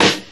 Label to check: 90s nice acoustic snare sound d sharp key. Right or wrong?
Right